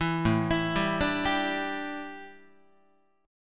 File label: A 7th Suspended 4th, A, down, Guitar